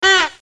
Honk; the Pea, The (1996); SoftKey

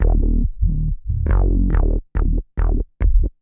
bass B; Techno Music Maker

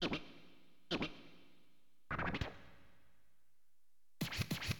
scratch; LiveAct Pool; AcidJazz Funk

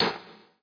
copper miner; Zeus

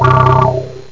juno bass, synth

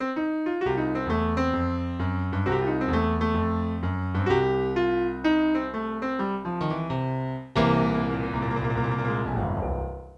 PIANO

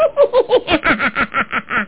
witch

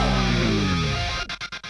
Exclamation; DareDevil; Daredevil Desktop Theme

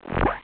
blip

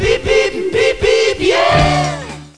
Beep Long Less Piano; BeatleBeep

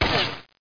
SHLD HIT; bugs

